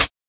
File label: Old School Smooth Hi-Hat D Key, pedal hi-hat sample tuned to the D note